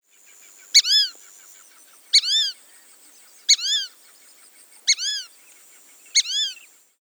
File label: Voice Fulvous whistling duck